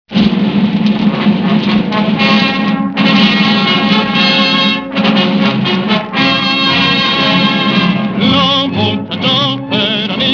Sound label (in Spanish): marcha